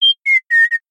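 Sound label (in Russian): svistun konec, whistle ending; звуки свиста человека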